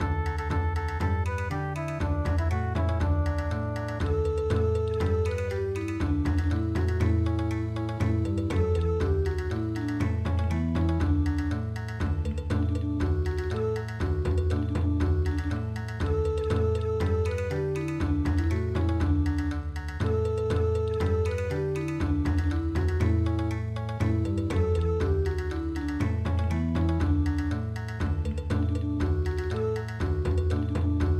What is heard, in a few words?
Japan Bon Odori, midi; Mura Matsuri